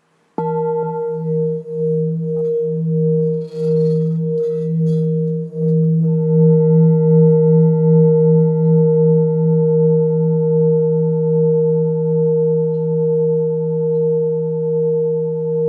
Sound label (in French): bol bengale Mi